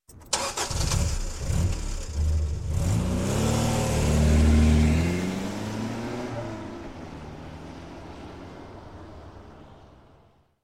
Toyota Car; Sound Ideas; Series 2000

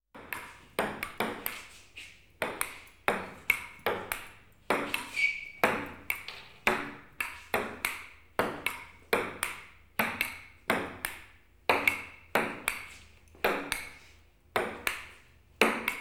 Ping Pong Continuous Volley; KMP Film Video Sound Effects

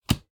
card play; dice, cards, game related; NS Studios - ultra sound collection

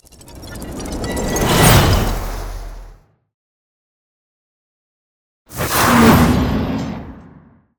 Ice Spell Transformation Invisibility; Arctic Aura

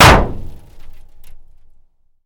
hood impact; Blind Drive

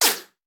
Bullet Ricochet; Sound Ideas; Guns

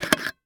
Specimen Jar Open Lid; Medical; Blastwave

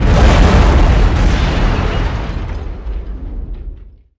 Explo Medium Muffled; Destruction

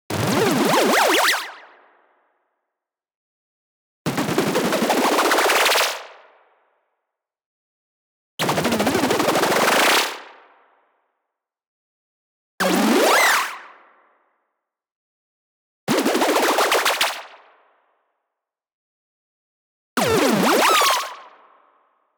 wobble, ascending speed up delay; boom library; Anime Essentials; DSGNMisc

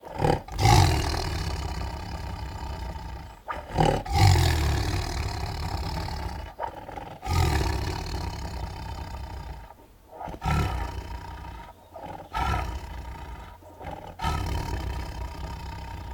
Angry Growls, Raspy Snores and Snarls, Tigers; Sound Ideas; Tigers Sound Effects

